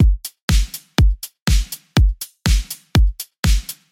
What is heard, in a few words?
122 BPM Full Groove; full drum kit; Battery; Alchemy